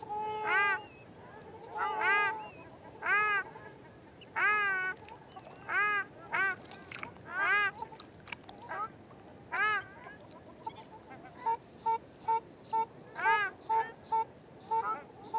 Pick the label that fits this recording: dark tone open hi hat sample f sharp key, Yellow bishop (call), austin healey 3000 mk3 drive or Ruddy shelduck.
Ruddy shelduck